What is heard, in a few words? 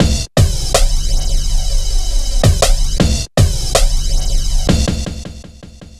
160, Drum Loops; Total Drum&Bass